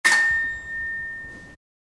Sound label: Doorbell